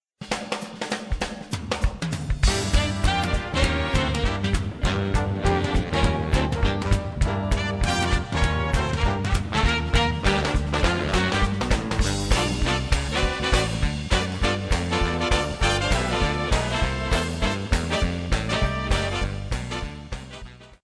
swing bb

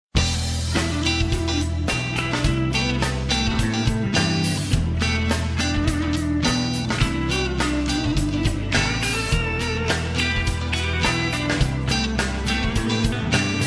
blues

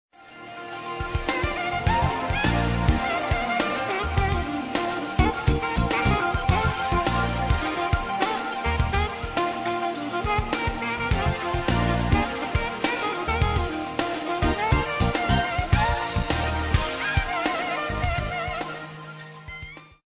jazz pop

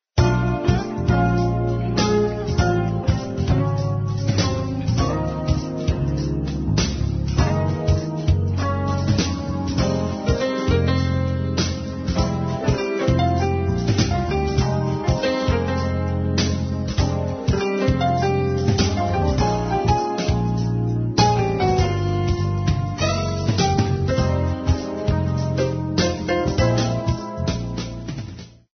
jazz smooth

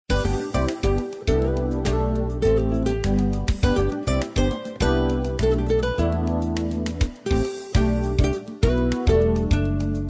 ez pop

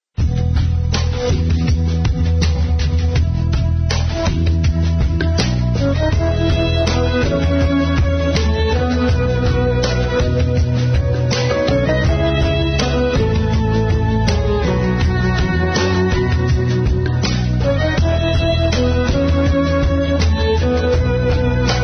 ez lite

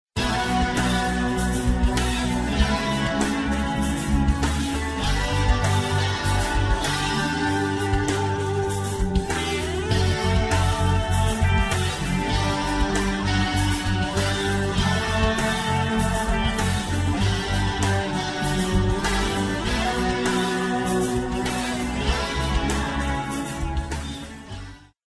pop rock

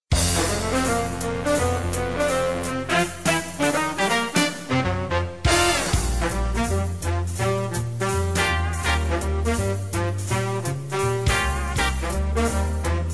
swing big band

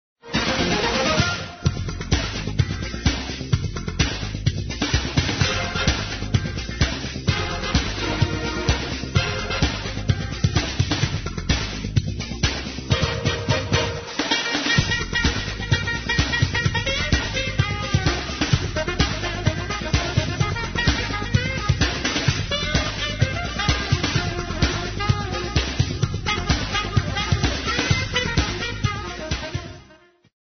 jazz pop

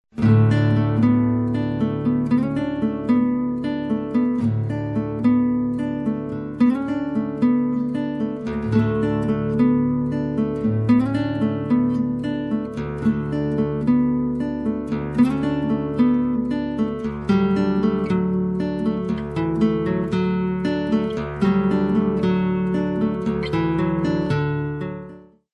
acoustic guitar

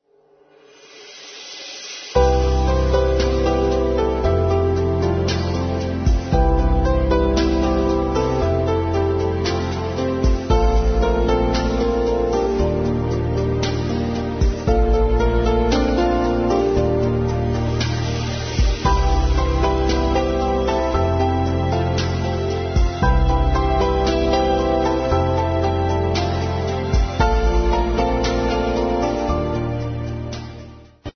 new age

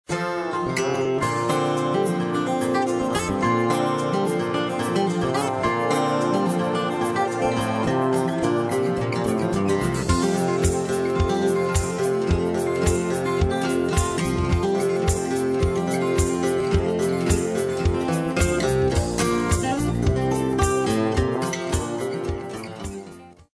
country

